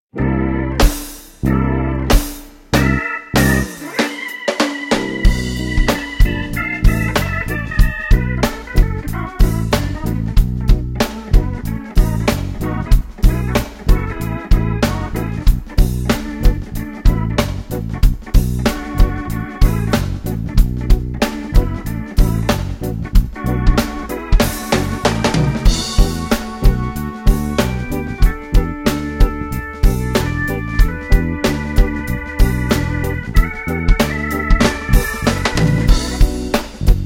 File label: funk fusion